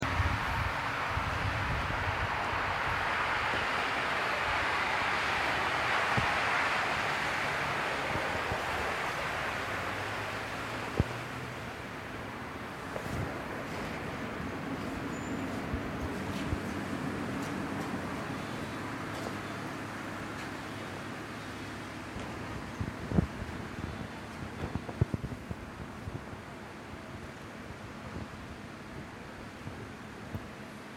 Lutten Klein Bushaltestelle, Rostock

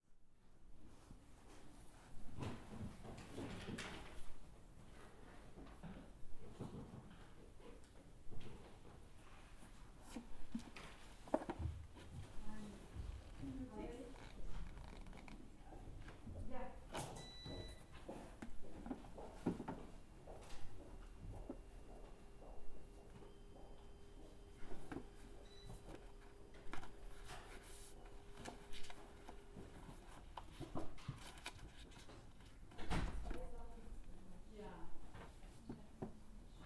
bucherei

